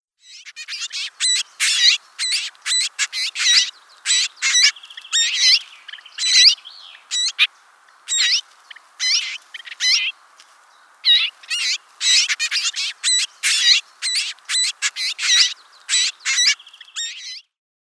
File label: Garden Warbler nestlings